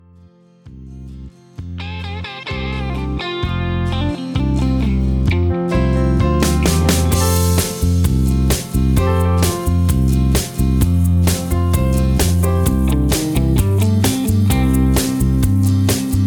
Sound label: Patter